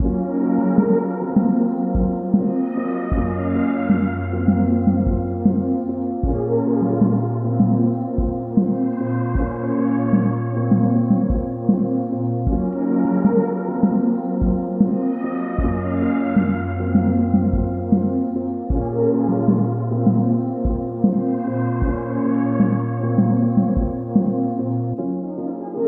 77 BPM A# Min, RnB; City Lights

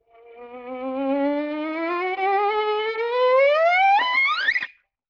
Violin Glissando Slide; Musical Elements

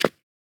Short foley

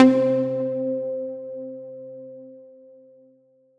Melody One Shot C Prog; Leads; Ghosthack

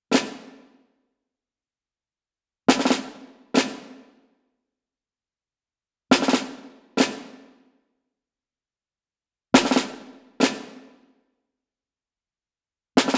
Snares 140BPM; Percussion Kits; Witch Doctor

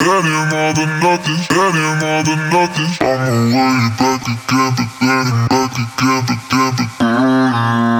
Vocal Chop - 120 BPM F Min; Cymatics; Euphoria Vocal Sample Pack

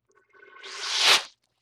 Wet Squoosh, Vintage Cartoon

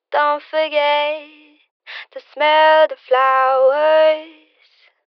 Phrase Dry 80 BPM C Min, Vocals; Flowers; Cymatics - Eternity Sample Pack; Phrases

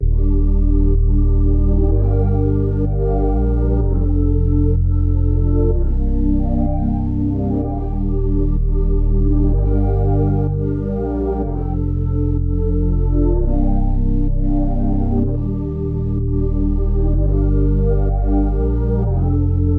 126 BPM G Min, Hip Hop, Melody Loops; Cielo

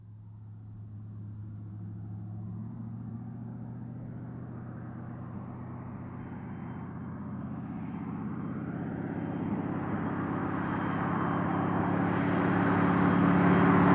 Muscle Car Slow By 40MPH; Vehicles; Cars & Trucks

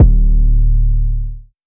808 D; Cymatics; Oracle Sample Pack; 808s

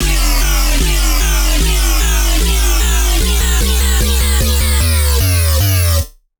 Bass Loop X RoboRise, Dubstep, 150bpm; Ghosthack; Dubstep Sound Arsenal

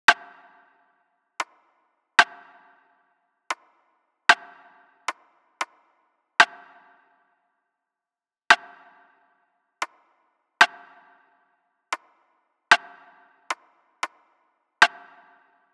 Drum Loop - 114 BPM Rim, RnB; City Lights; Cymatics; ANALOG EVOLUTION Beta

